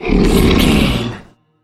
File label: troll monster growl long